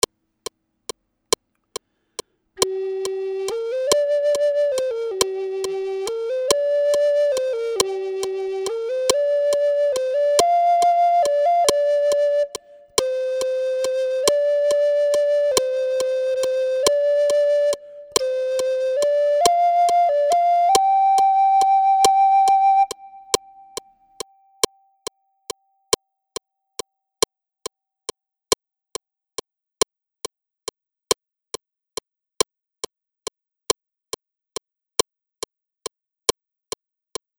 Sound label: Flute; Duets